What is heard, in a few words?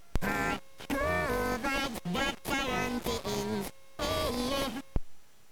sbpro2 opl3